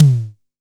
Tom Vel Low, Tama TS-206; Preset 1

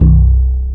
BASS, POWER SYN; AKAI S6000 CD-ROM - Volume 1; SAMPLE WAVE MIXING 2

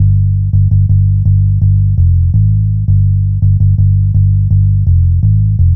Long Bass, Bass Lines 166.5; Zero-G Jungle Warfare 1, 2 & 3; 90 s Sample CDs

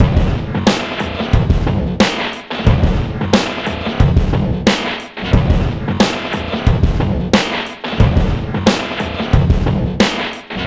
Loop; Sonic Foundry (Sony Creative Software); Esoterik Beatz

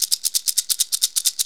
SMALL SHAKER; Zero-G Ambient 1; MISC SHAKERS

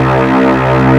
lead; Mono

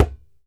DJEMBE; E-MU Producer Series Vol. 7 – Old World Instruments; Drums&Percussion

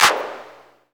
CLAP HOU, Dance Mega Drums; Best Service

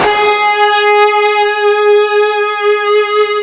FUZZ G#3, Guitar; East West; Steve Stevens Guitar Samples Collection

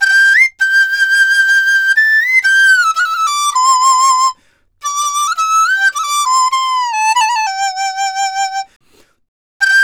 DIZU, 90; INIS - Opium; DIZU 100-C

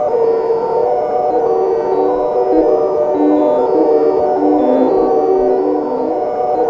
voices, scifi atmos; Zero-G Ambient 1

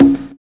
UDU BEAT; Univers Sons - Kitch 70s

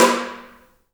HAIRCUTS, KENYA DRM, Heart of Africa

